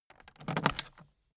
aluminium case pick up

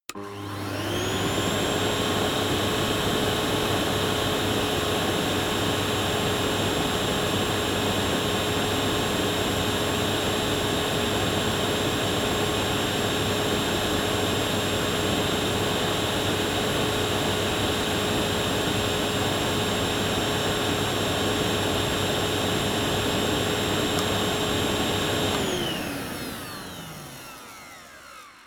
vacuum

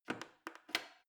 plastic button creak defect press

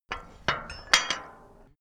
metal gate bolt

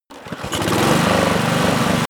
engine small turn on

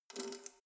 letterbox metal key pull out soft

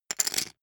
poker chip stack rattle

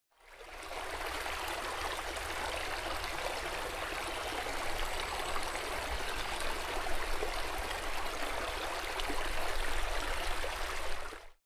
stream

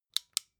flashlight off

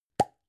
gum plastic box open hard

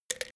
pills drop into box

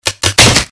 wood short breaks

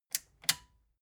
metal button click power off slow

